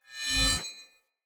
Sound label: Cleansed Spear Whoosh Accent Heavy; Weapons; Player